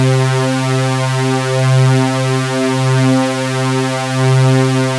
supersaw

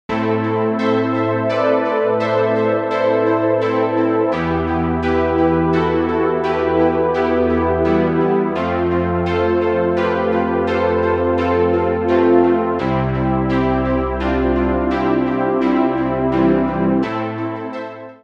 Noise Saw, struck instrument simulator

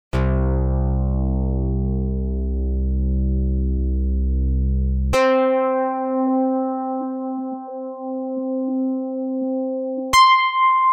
karplus 2

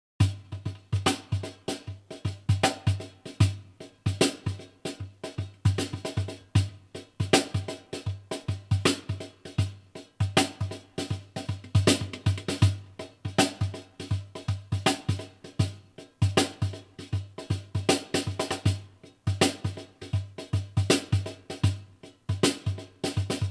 cajon

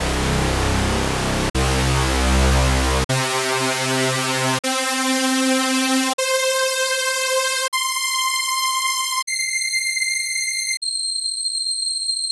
noise saw

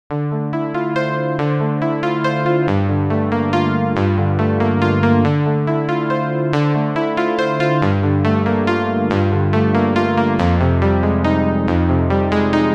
Wire, struck instrument simulator

